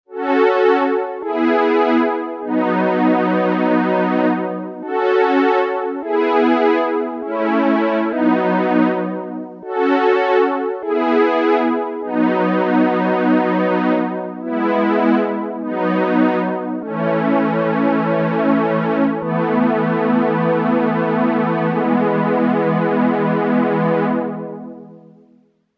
High Strings

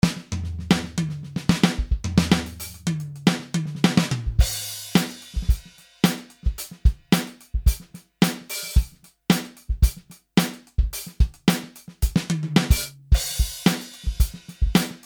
funk

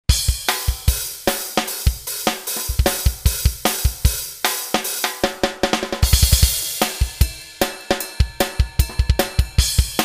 roto rock

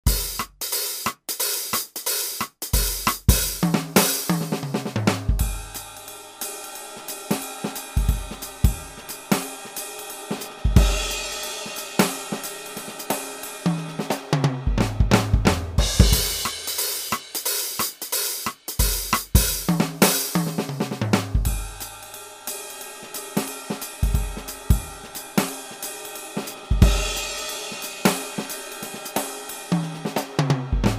jazz

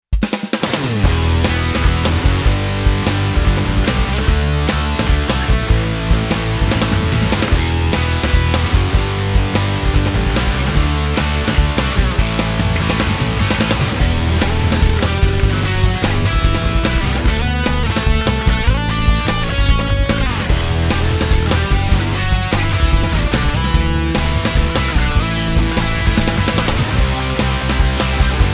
ds drive

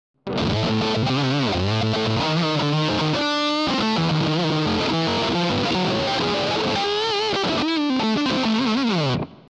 fuzz; gs10 friedman

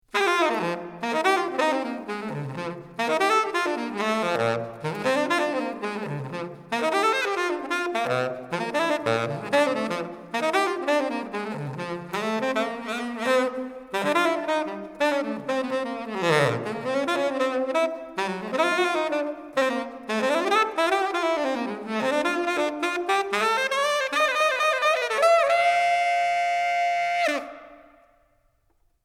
sax

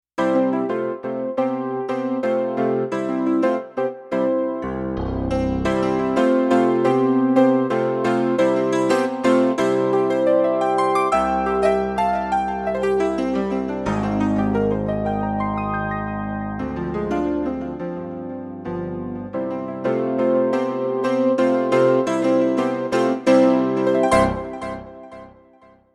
piano; rd-800 demo song sa

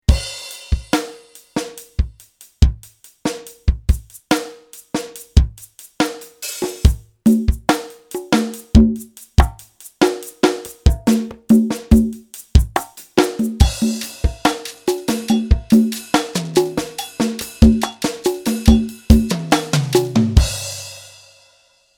drums

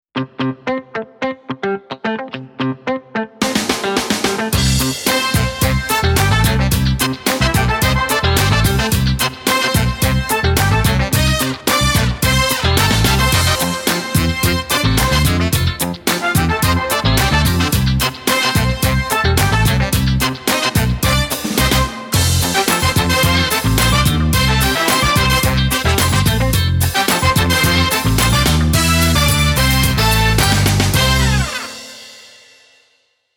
funky

